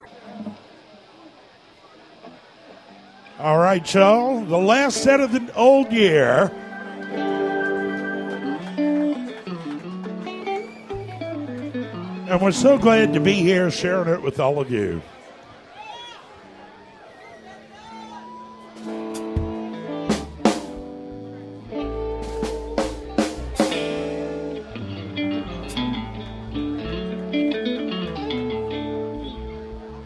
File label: intro tuning